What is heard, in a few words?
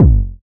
phat kick single shot g key, Royality free kickdrum sample tuned to the G note. Loudest frequency: 193Hz